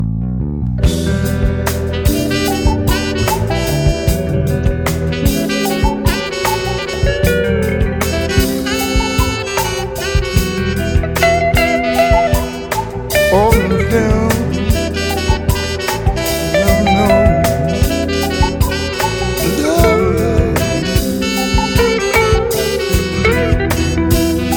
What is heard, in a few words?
funky